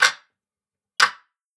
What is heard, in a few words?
Ticktock